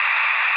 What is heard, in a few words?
Shhh; PC Gamer IT CD 41; machines demo; Interfer